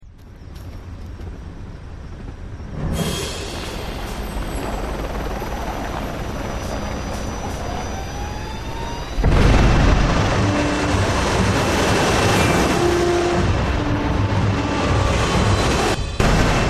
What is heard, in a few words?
Start Game Main Rail